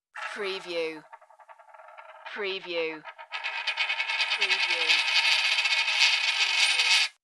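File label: Coin Spin Table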